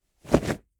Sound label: whoosh cloth leather fight